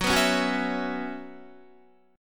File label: Gb 7th Suspended 4th Gb down Guitar, chord